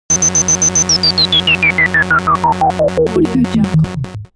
game fx working robot; smartsoundfx

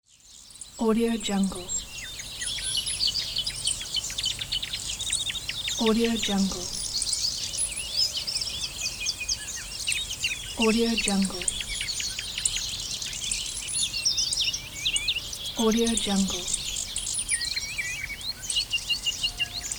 garden ambience

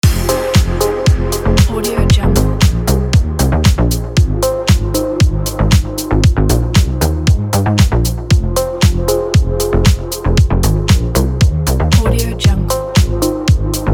in background loop

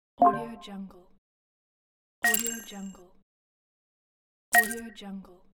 click interface pack; andrewsound83

